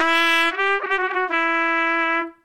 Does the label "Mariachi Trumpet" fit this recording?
Yes